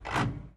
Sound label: Gear Grinds